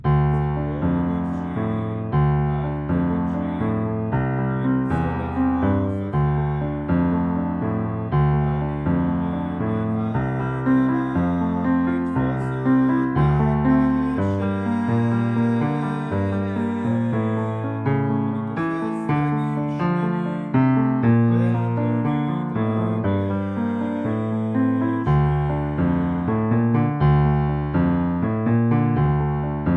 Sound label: Piano R